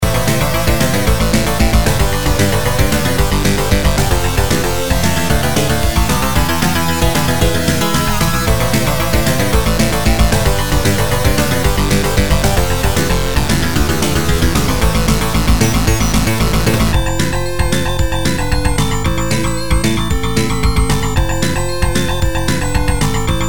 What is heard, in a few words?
ringtone be careful; ys3 genesis